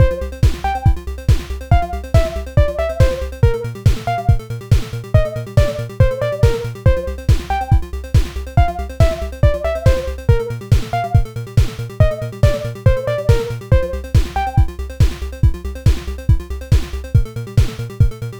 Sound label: music; Backpack Monsters; js13k 2019